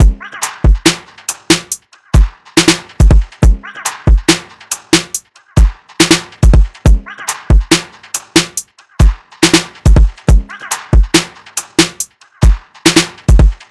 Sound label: drums 140, Full Drum Loops; Slate; SEISMIC Drum Sample Pack